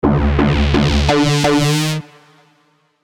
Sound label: Synth Fills D (128 BPM)